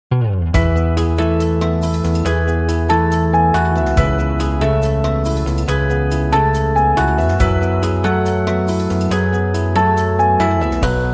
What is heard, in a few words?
Everything Clicks